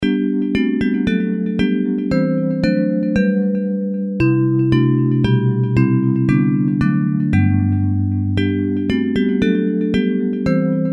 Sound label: Bells